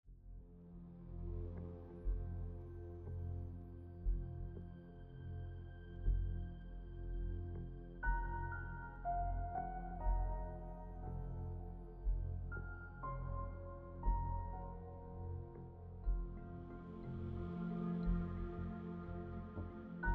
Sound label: Thriller Hybrid Piano Twisted